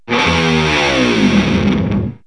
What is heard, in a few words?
Guitar Bend Down; Music; Rock; 16-bit; KABOOM! - Wild and Wacky Sound Software; 1,000 Sounds